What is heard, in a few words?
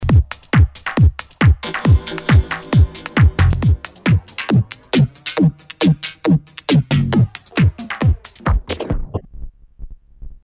Zip